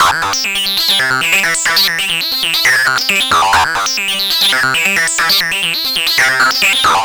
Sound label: Synth